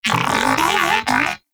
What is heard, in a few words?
Moa Pet Tenacious Enemy Spotted; Pets; Warframe